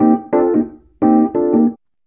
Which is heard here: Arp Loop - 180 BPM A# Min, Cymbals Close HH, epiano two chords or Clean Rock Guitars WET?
epiano two chords